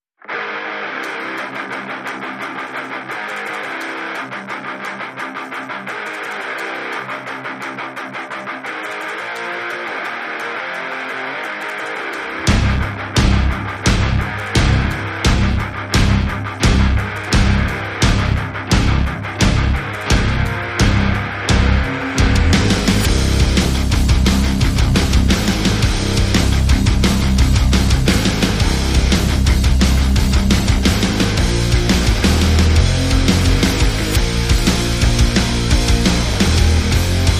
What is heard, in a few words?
No Synth